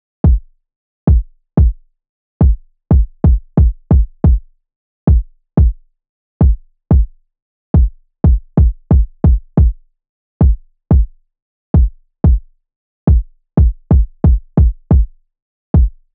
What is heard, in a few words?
kick